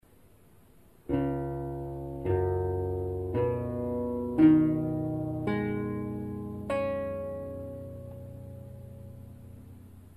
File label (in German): Laute Stimmung; Instrumentenkunde